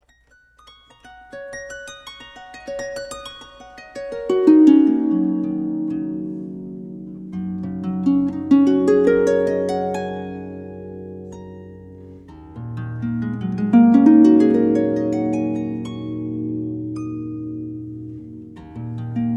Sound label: Quantum Harmony, harp